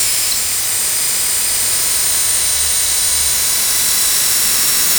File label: malfunctioning implants, cyberpunk